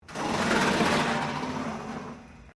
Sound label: METAL BIN SCRAPE WIRE FENCE